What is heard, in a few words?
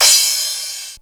CRASH, DRUMS; Crypton - DrumBase5000